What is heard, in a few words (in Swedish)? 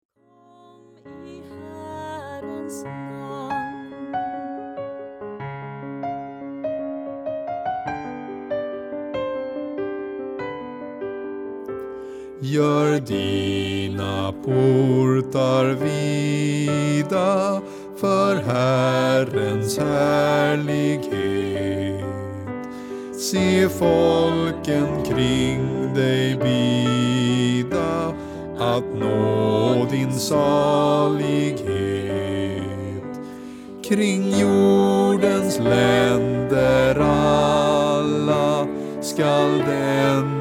bas